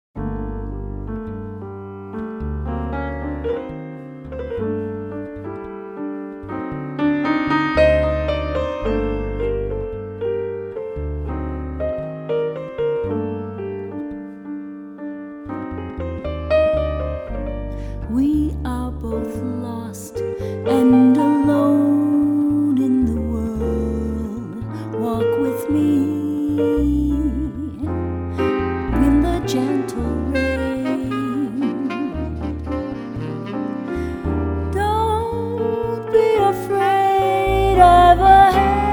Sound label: Bossa Nova Quartet; Swing Band